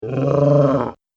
Orc Sore Throat; Monster Sound Effects; horror sound effects

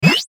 Mouseover Power Up Ascend Short; Multimedia; Blastwave

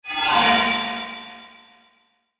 Metallic Fast Wash; Metallic Sound; Blastwave

